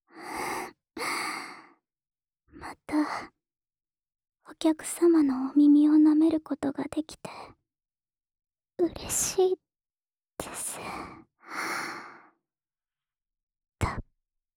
耳舐め 右, ASMR; 六花

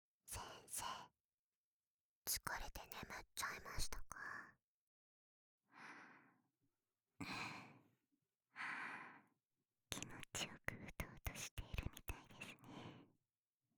両耳舐め＆キス, ASMR; JK3人のねむねむまったり

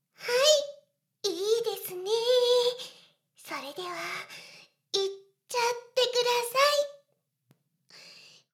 シコシコ音声; ダメなメイド

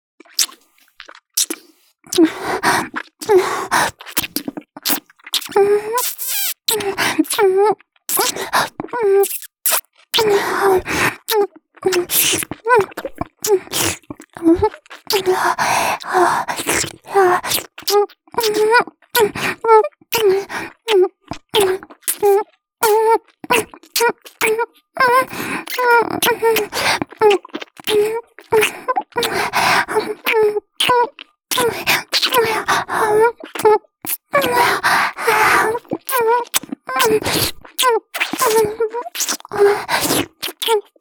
リップキス, ASMR

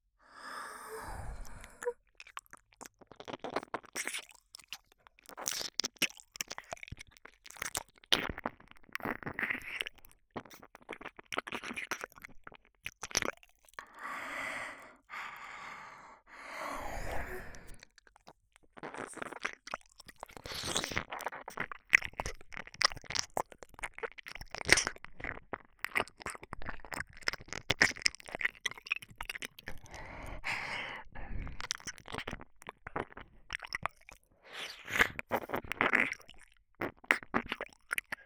耳舐め; 彩夢の耳舐め愛情表現 リピート版

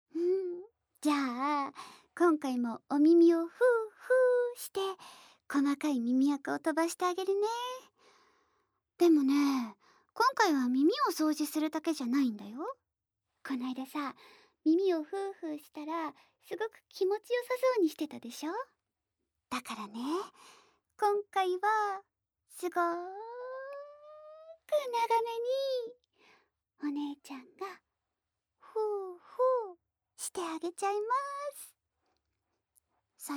ふーふー 耳吹き右; おまけ。後日談。お姉ちゃんがふーふーして、あげちゃいます（耳吹き右）

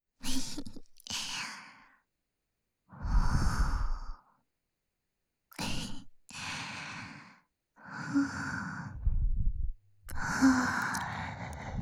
耳舐め（左）舔耳

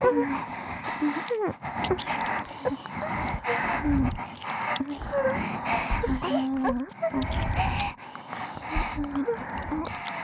耳元喘ぎ 耳キス; 美月＆美星のW耳元喘ぎ＋W耳キス

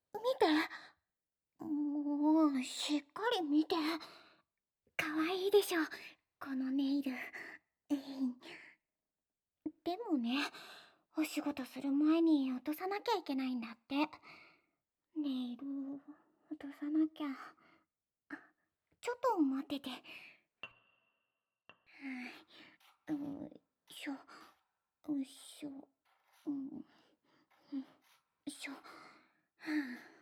オルゴールVer; ASMR; 頭なでなでと大好きコール

